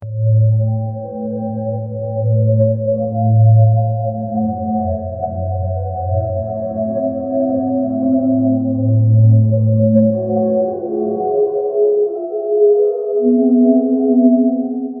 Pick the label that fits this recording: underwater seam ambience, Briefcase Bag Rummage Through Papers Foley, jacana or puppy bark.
underwater seam ambience